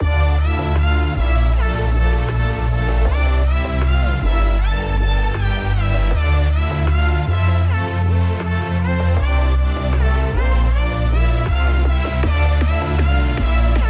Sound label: Heavy Electronic; Heavy Electronic Music Pack Vol. 2